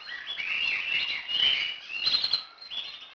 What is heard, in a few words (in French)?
chant d'oiseaux